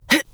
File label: Grunt Jump; paladins; Tyra